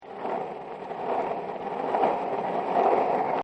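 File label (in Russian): шум горок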